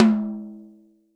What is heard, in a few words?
TOM, Real Drum Kit, Rock Kit; Best Service ProSamples vol.43 - Real Drum Kits